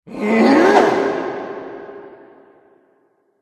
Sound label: angel attack voice up